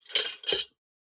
Glass Shuffle; SoundsCrate

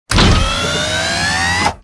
Robotic Movement; SoundsCrate

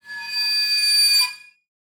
Evil High Scrape; SoundsCrate

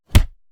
Fight Impact; SoundsCrate

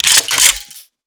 gun cocking; soundscrate

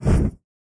Fire Woosh; soundscrate

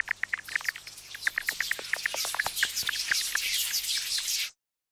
bats

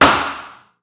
Gun Shot; soundscrate

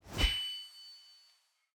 Sword Swing; soundscrate

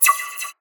scifi weapon blaster accent double

